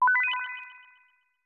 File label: Pause Jingle; batman revenge of the joker; snes